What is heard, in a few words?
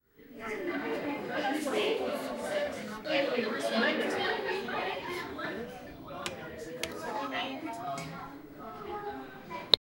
clubhouse appearing